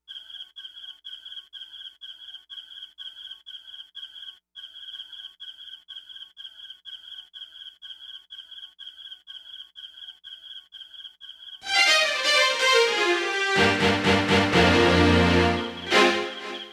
Outside Violins

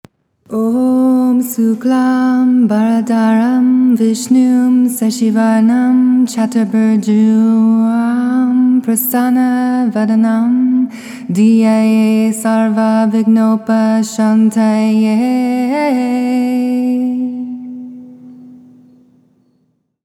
Ganesh Mantra

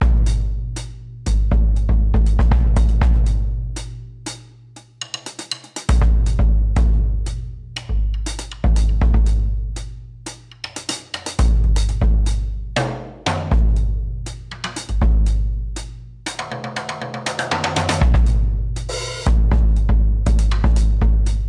marching

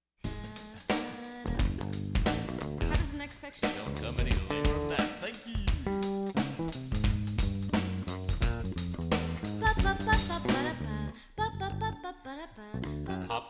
jazzy

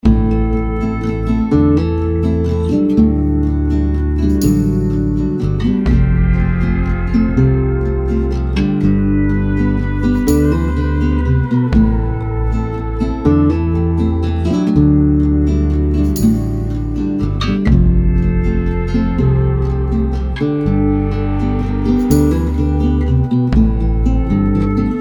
Long Last Waltz

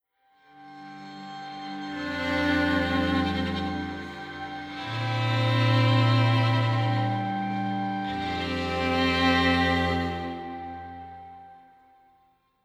Gentle Chords Transition

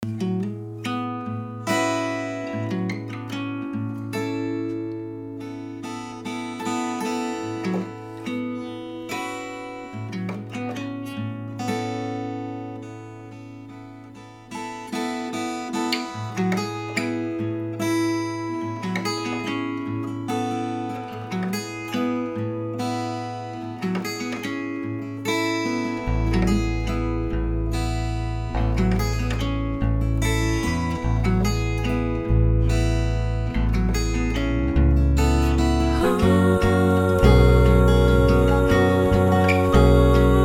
Acoustic Southern Settled

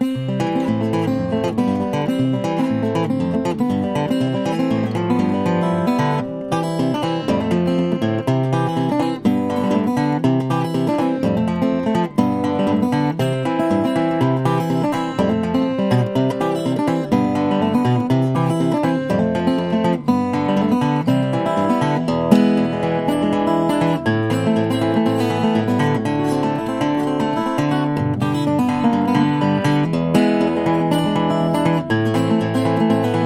Guitar

